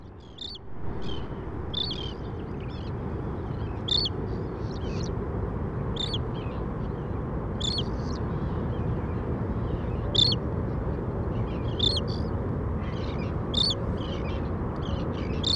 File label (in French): Sterne voyageuse Thalasseus bengalensis, oiseaux de mayotte; oiseaux cotiers et marins; faune de mayotte